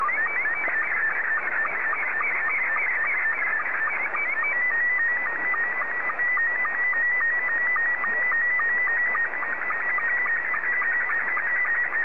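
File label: SSTV, Scottie 2; JA3QJQ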